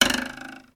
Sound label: comedy ruler twang; sound effects one